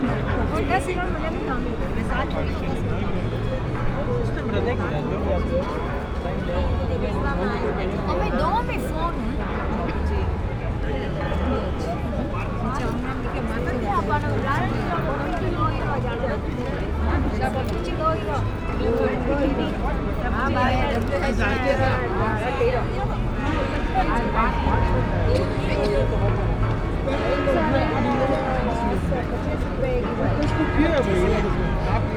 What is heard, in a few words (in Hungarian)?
este 6 ora harangok